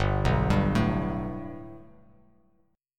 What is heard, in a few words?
G Major Add 9th chord; Gadd9 arpeggiated; JGuitar